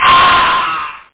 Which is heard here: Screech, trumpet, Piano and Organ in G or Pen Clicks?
Screech